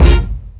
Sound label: Kick